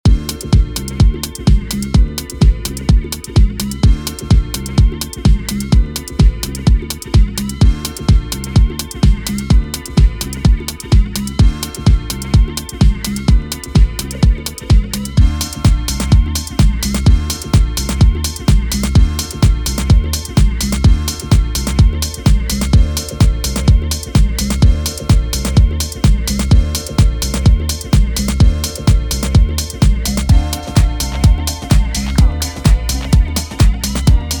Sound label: Chicago House Music